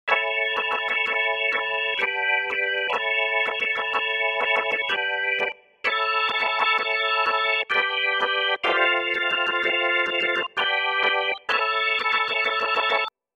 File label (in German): re trigger, Tonrad